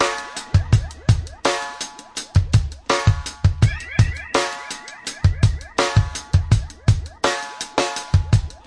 83 trippy ringy snare beat 3 bars, drum loops and breaks